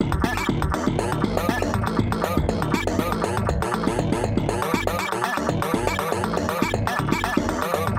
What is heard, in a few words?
Clockwork Machines A - Human